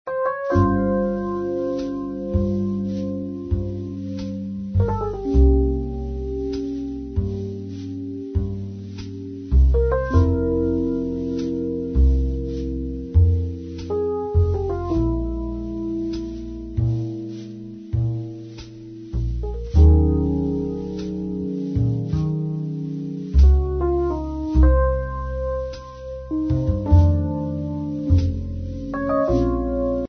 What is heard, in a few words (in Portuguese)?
triste jazz